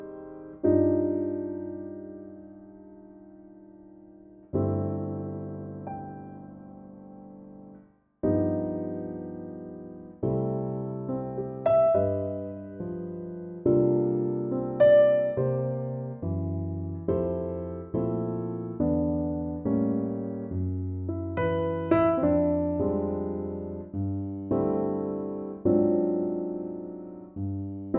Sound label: C piano; key - Cm (verse) to C (main song) - vocal range - B to E; Pianotrak Main Demo